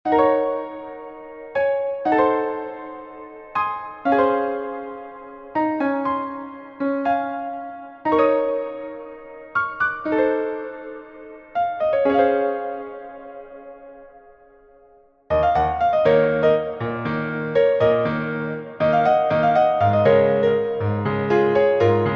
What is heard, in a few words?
Piano